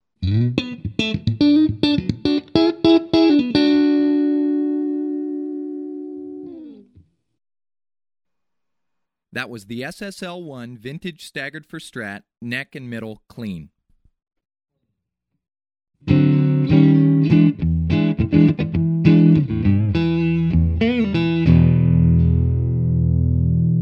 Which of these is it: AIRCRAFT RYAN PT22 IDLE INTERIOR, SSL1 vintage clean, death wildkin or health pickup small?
SSL1 vintage clean